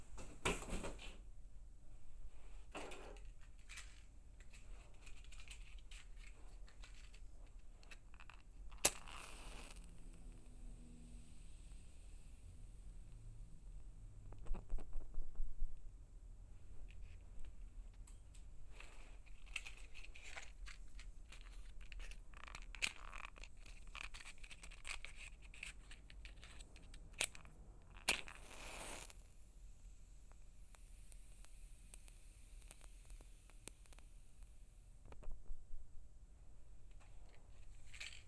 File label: Matches Matchbox Shakes; binaural